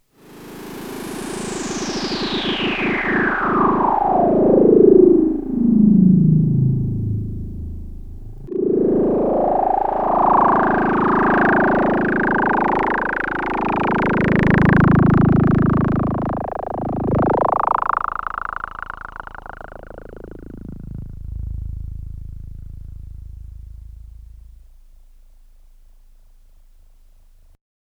lfo mod sweep

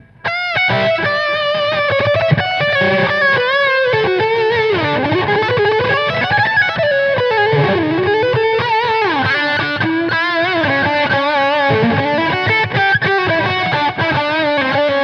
Dual Rectifier

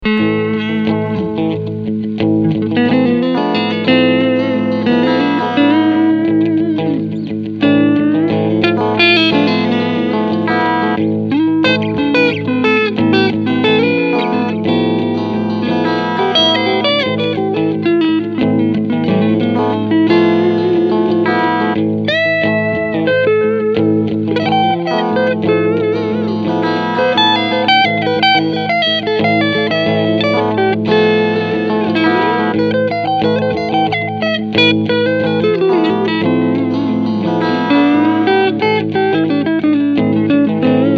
front clean

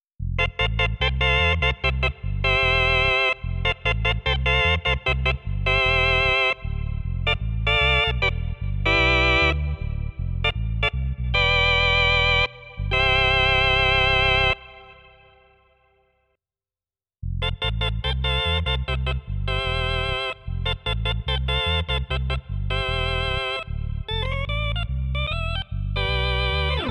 Nord C2D Vox JC Twin

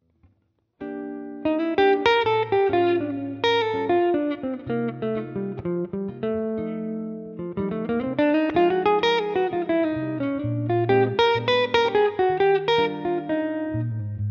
neck position solo line

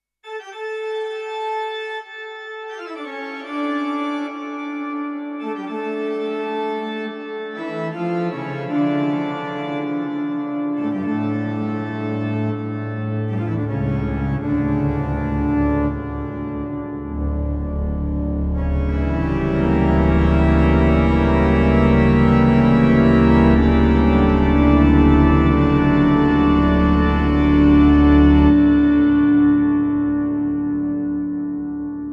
Sony DRE 2000 Organ Sony DPS R7 Grand Canyon

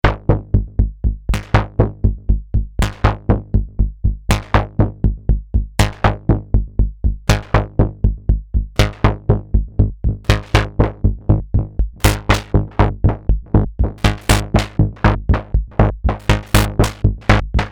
Subsequent 37 Poly Rhythm

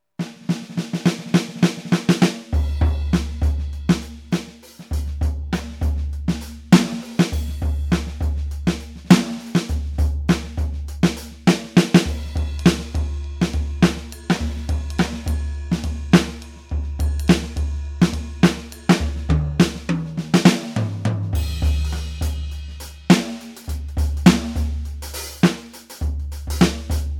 ATV a5D Kit BeBop

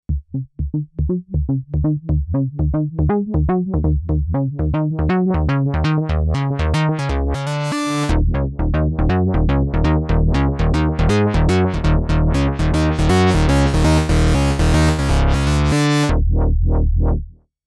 Synth Preamp; Elysia skulpter 500

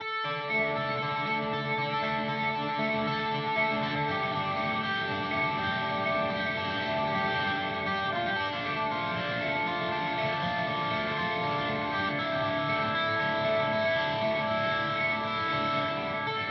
Amp Phaser; Boss GT-1000 Core

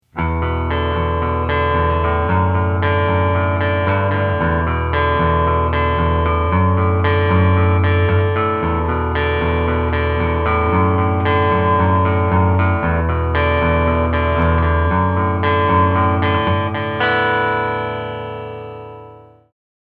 single note picking

